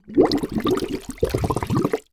Liquid Water Glass Submerge Tall Glass In Water

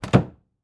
Door Closet Door Close; household

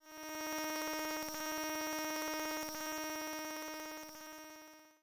Sci-Fi Electronic Data Stream; Science Fiction

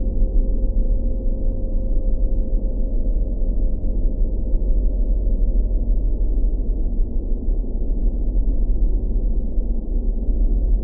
Drone Ominous Dark Ethereal; Production Element Imaging; AdobeCC

